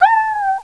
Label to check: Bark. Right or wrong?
Right